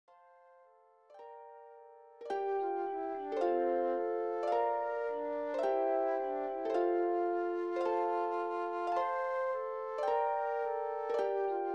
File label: hymn, F, Low